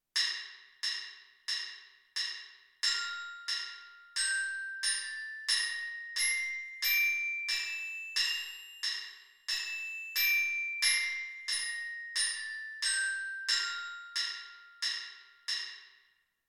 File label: f major scale orchestra bells